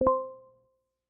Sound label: Spatial Profile Scan Completed; Apple; iOS-18